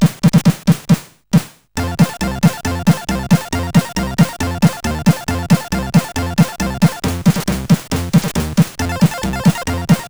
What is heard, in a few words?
CSG8580R5